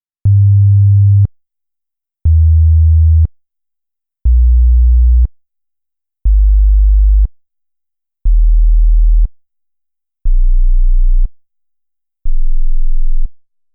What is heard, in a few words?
bass sines apple ipad volume max matched; galaxysii; anandtech; galaxysii review